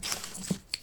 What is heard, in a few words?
chips Handle, Casino; Kenney's Sound Pack